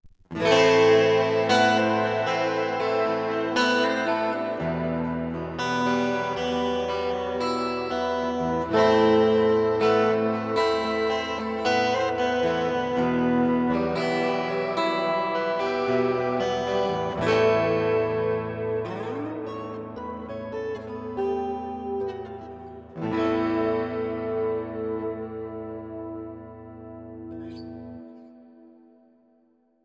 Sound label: 12 String; Archetype Tom Morello